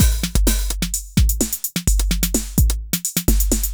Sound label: Beat, Drums & Percussion; Electrocution; Beats